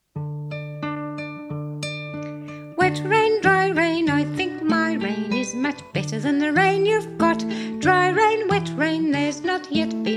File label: Canon for a Corrugated Tin Roof